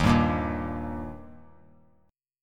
D sharp Major D sharp, chord; strummed; JGuitar

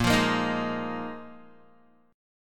Bb 7th Suspended 4th Bb Guitar 4E,4A,5D,5G,5C,6F

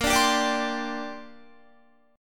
Bb 6th Add 9th Bb Guitar; Bb6add9 strummed; 18,17,17,19,19,19

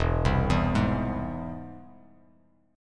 E Augmented; E+ arpeggiated; JGuitar

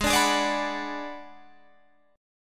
G sharp Minor 7th Flat 5th G sharp down; G#m7b5 strummed

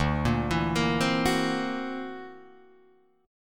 D Augmented 9th down Guitar DADGAD

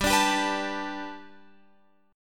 G Major Add 9th Guitar Open F, chord; Gadd9; strummed; x,14,14,14,14,16

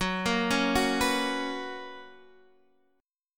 F sharp Major Add 11th; F#add11 arpeggiated

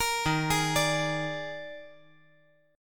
Eb Major 7th Suspended 4th Eb; arpeggiated; 15,15,16,17; EbM7sus4

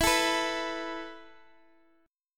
E Suspended 4th E, down, Guitar, DADGAD

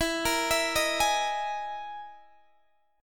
E Major 7th Flat 5th E down Guitar DADGAD

